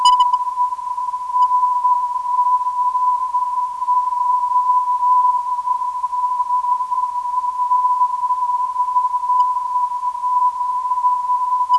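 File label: 399kHz, non-directional beacon; NGY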